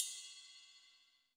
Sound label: RIDE 2, Yamaha SY85, Drums